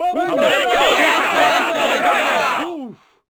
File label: burst, Crowd